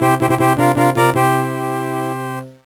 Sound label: Bonus Win; SMA4